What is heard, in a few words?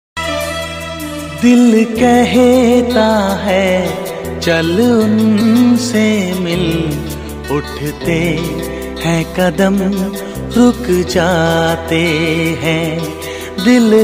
new ringtone, hindi ringtone